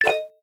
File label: Chat Sound